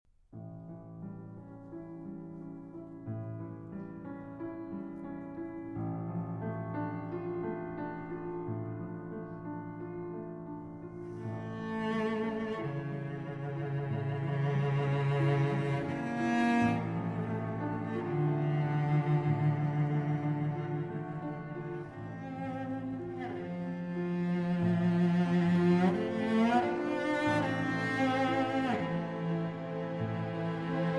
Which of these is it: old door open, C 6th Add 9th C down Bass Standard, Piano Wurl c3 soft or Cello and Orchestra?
Cello and Orchestra